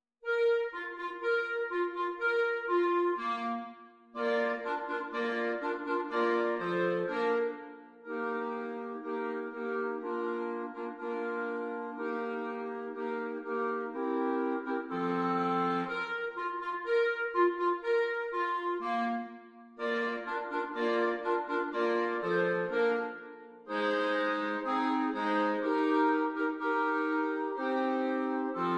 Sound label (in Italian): quartetti; 10 easy quartet for clarinets